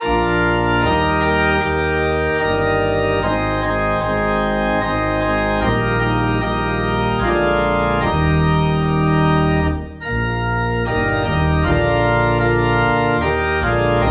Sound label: Organ Low in E Flat; Oh for a Thousand Tongues